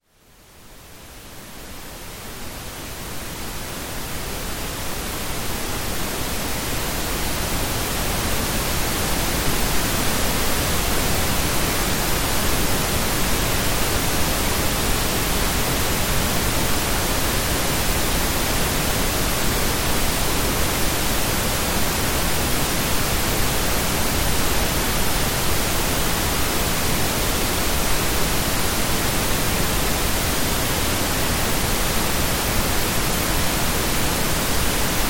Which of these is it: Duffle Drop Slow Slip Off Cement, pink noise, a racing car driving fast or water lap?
pink noise